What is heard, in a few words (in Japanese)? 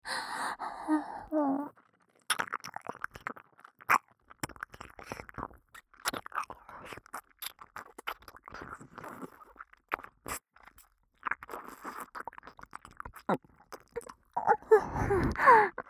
左耳舐め, ASMR